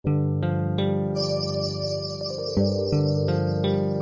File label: musical ringing bell short sms tone ringtone